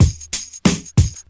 92 bpm drum loop e key, Free drum loop sample - kick tuned to the E note. Loudest frequency: 1893Hz